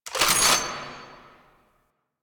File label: Assist Rechamber; gameplay; Warframe; Nineteen Ninety Nine; Quincy